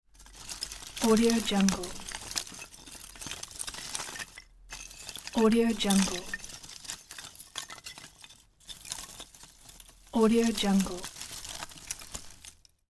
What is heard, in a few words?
fire sticks; چوب هیزم